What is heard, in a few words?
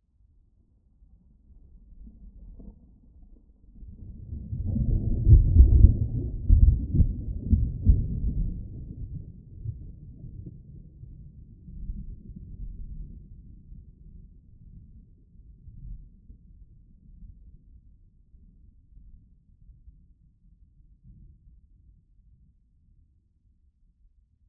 Thunder very distant; boom library; Thunder And Rain